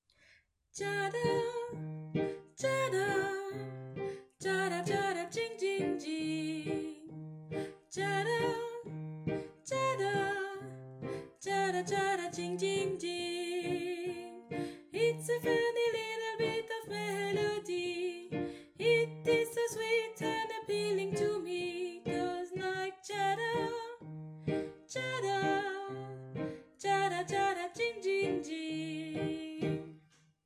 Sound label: refrain sop